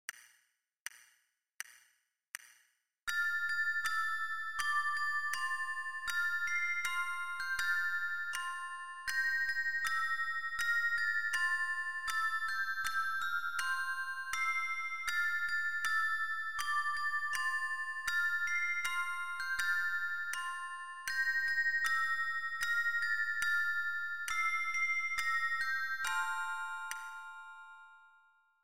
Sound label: count 4 bell